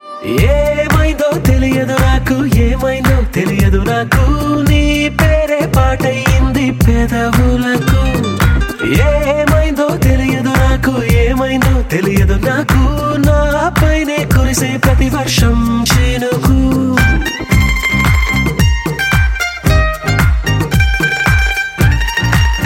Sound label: Male